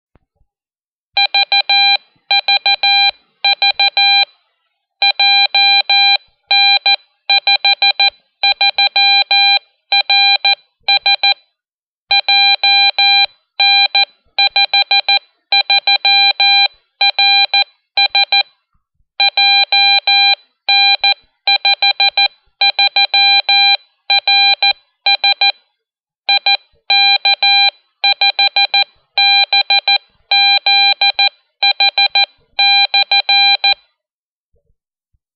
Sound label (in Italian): Identificativo CW Beacon